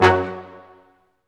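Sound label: BRS, Brass Ensemble stac; Roland L-CD702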